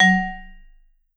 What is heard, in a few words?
Vibes; Sampleheads; Dave Samuels; Marimba & Vibes